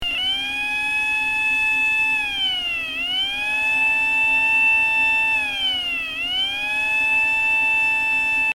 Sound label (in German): Volkspolizei Motorsirene